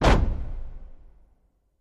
Staff Hit Single, spears & staffs

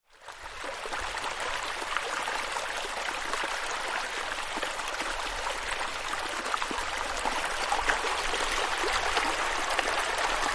Close Up On Desert Stream; streams

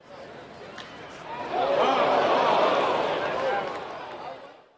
Crowd Astonished Ohh, baseball

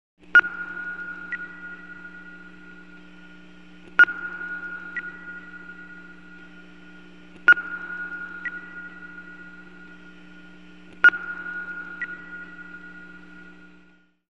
Spaceship Sonar Blip

